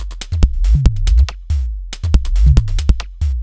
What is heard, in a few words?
Beat Rest